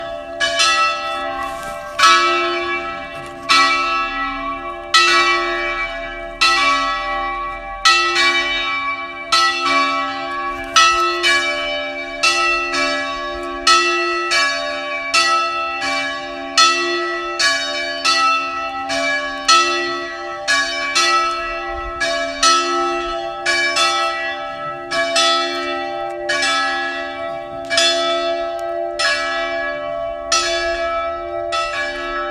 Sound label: Click Tower Bells; Ropollo